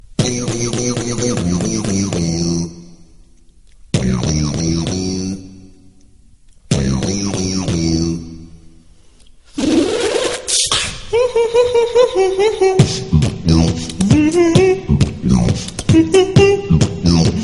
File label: Beatbox